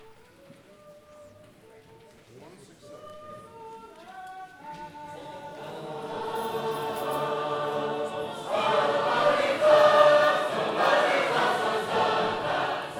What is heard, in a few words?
Pray Brethren Pray, sacred harp